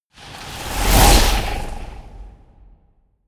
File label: whoosh flutter